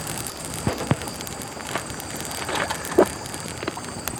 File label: Fire Campfire Small